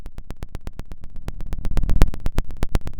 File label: Sound of a fire crackling